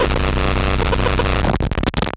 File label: Raw Glitch